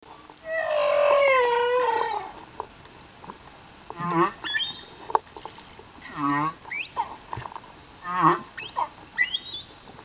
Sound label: humpback whale singer tonga